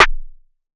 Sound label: Snare; Petit